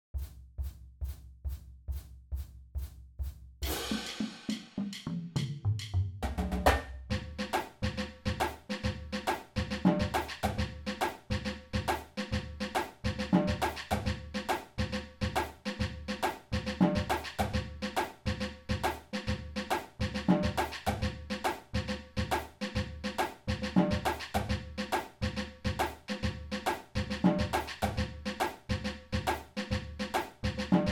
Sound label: PERC